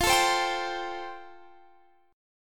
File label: F Major Add 9th, Guitar, Standard; Fadd9 strummed; JGuitar